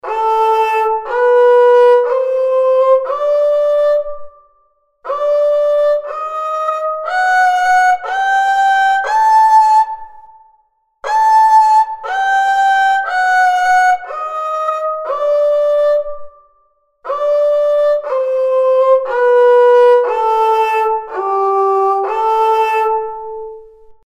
kemençe karcığar

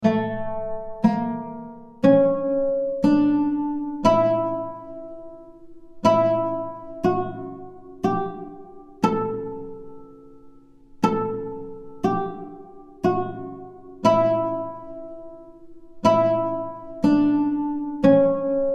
ud uzzal

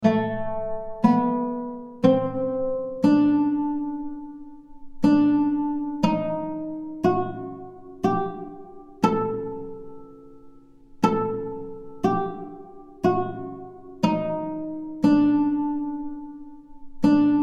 ud karcığar

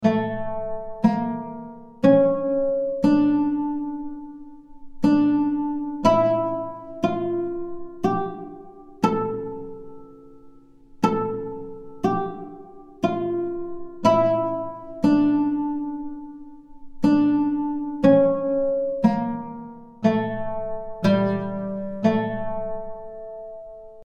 ud hümayun

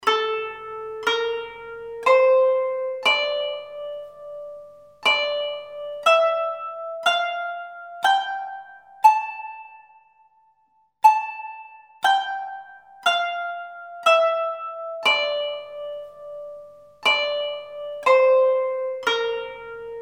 kanun kürdi

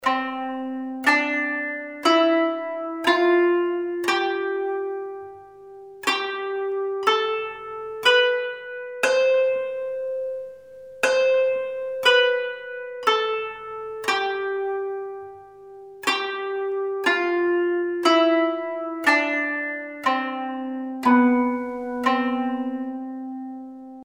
kanun kaba çargah

